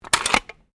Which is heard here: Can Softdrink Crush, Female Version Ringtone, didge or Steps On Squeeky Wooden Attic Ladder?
Can Softdrink Crush